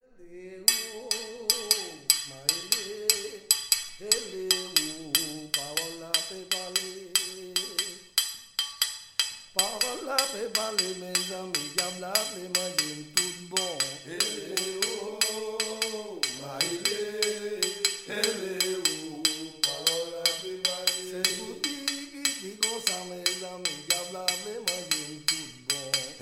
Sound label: merengue